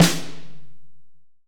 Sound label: dark steel snare drum sound f key, snare sound tuned to the F note; snare-drum-samples